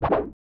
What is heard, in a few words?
Swipe